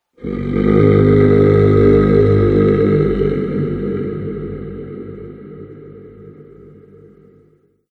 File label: Ghost Egyptian Phantom; Ghost Sound Effects